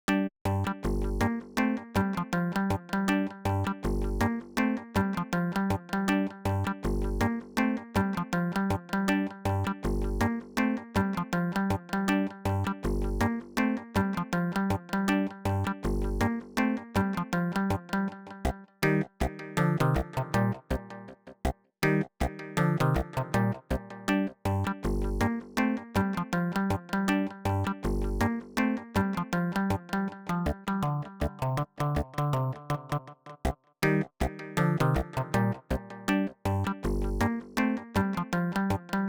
Riff

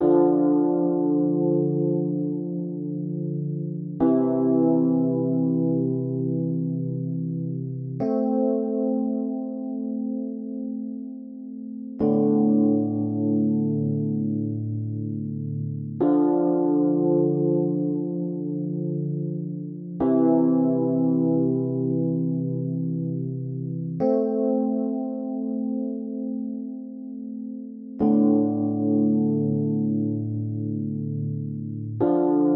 D120 Dystopiano dry

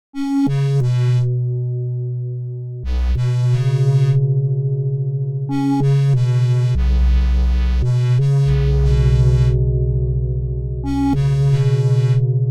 90 Synth

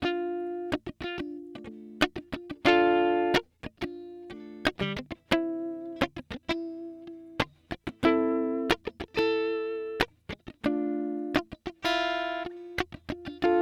Dm90 gtr

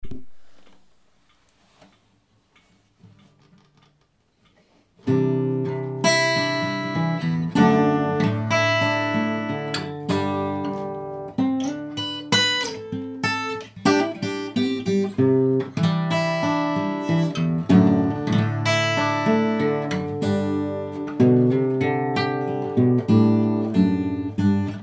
Zoom HN2 Acoustic Recording

